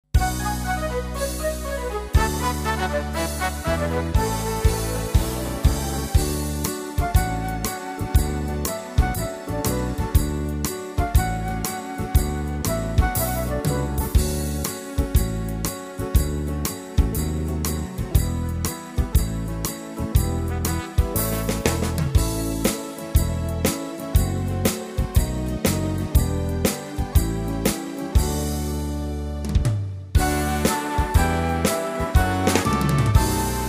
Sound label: Christmas Shuffle; tyros2